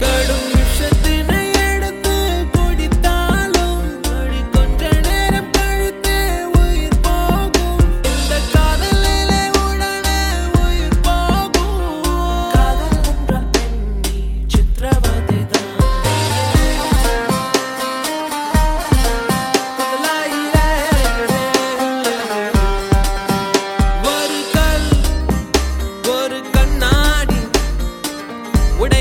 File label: tamil sad love